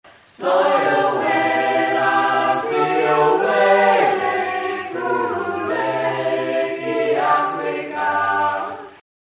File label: Tenor